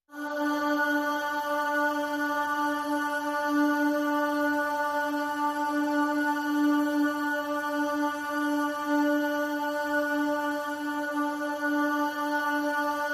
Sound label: Pop Vocal D